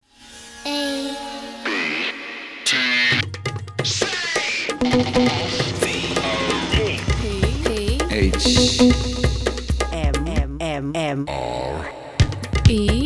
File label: Telegraph Commercial, Electronic Music